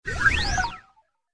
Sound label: Fast Droid Flyby